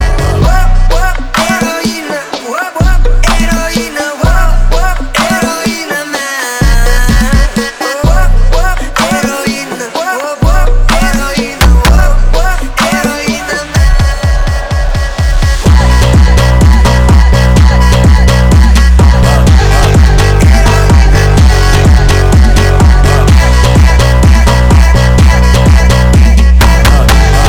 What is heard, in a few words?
funk